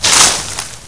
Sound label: fol move; svencoop